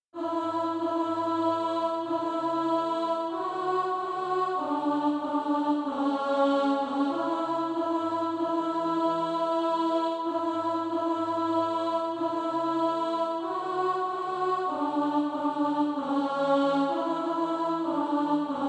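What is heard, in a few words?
alto; A Few More Marchings Weary; SATB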